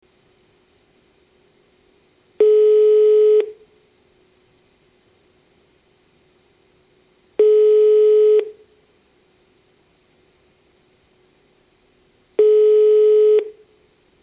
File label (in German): telefon rufzeichen; salamisound; herzwissen grundschule materialien